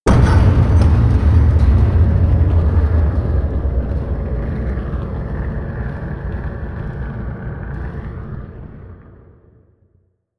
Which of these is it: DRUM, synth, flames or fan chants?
flames